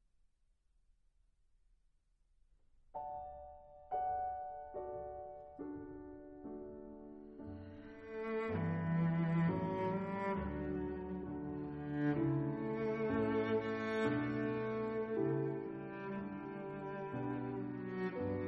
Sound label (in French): Variations chantantes cello piano